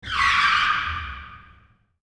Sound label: Auto Skid; iMovie '08 Sound Effects